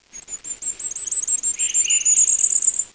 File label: bird; quake2